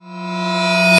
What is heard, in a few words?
Flashback